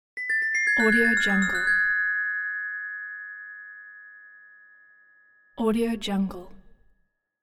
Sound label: glockenspiel